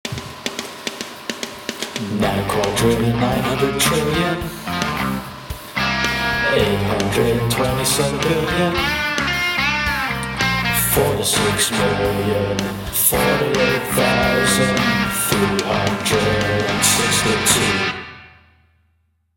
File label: Jingle